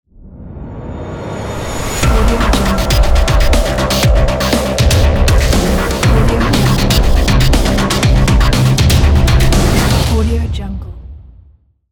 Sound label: groovy tech logo